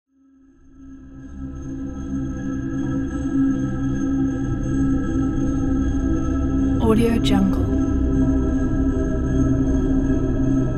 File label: deep space ambient; infinity sfx productions